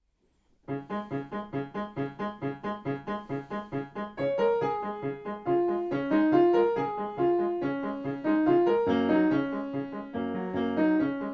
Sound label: Piano Suite II Scherzo; Piano Suite No. 1: "Simple Suite"